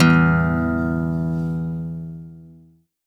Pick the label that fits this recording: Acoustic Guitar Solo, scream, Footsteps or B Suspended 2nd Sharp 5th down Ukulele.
Acoustic Guitar Solo